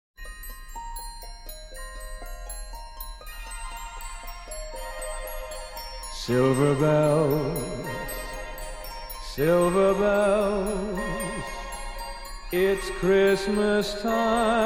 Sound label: Male Voc with Orch